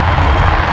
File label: scrape st